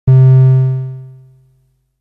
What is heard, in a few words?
Tristar, synth lead